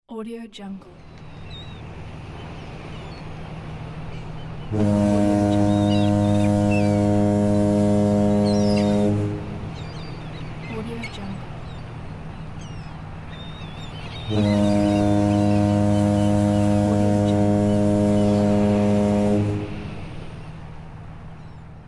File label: sea port